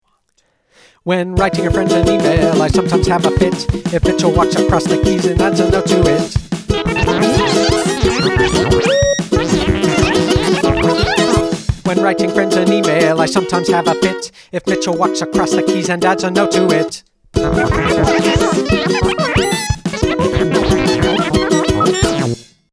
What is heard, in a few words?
Techno Cat